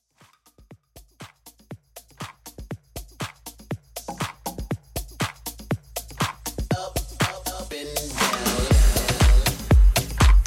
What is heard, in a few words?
Hybrid Club Rework 120 Bpm Clean